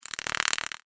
Clicky Laser Gated Pulse; science fiction sound effect

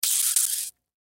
Squish Squeeze, Squish Wet Juicy Squeeze; Squishes; Foley; Blastwave

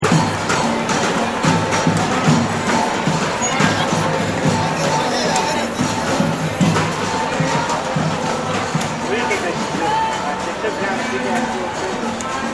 Protest Crowd; Chanting; Yvette Jackson; Voice

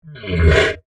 Monster Groan, Horror, Monster, Groan, Creature; Monsters; BlastWave

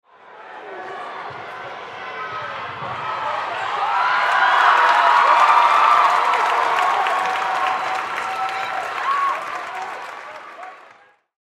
Crowd Arena Cheer, Crowd Arena Big Cheer; Crowds (Sound Effects:Backgrounds); SPORTS; Blastwave

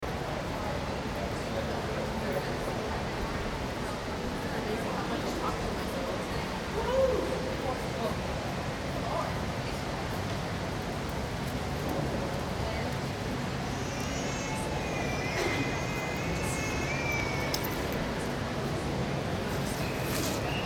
Shopping Mall, Shopping Mall, Mostly Empty; Large Shopping Mall , A Few People , Baby Cries , Kids Play In B / G; Crowds; Voice

